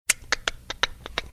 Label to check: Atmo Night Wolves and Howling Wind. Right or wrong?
Wrong